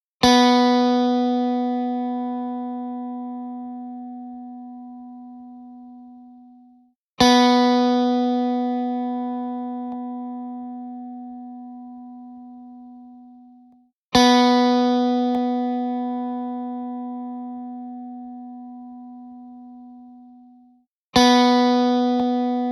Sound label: B String